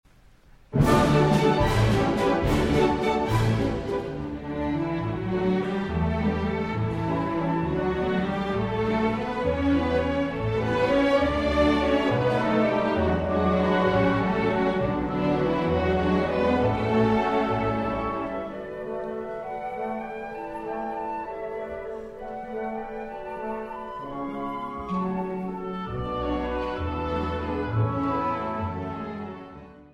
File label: radio waltzes